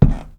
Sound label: vent step; Golden Crayon Sounds